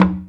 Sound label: Perc, Percussionz